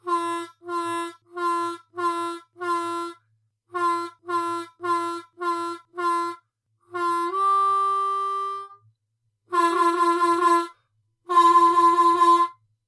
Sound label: Riff; poly rhythm